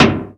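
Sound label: SP-12, drum machine; Best Service Gigapack 2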